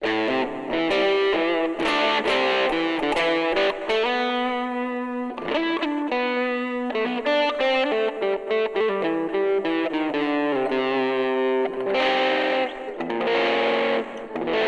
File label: strum buddy distortion reverb; fluid audio strum buddy test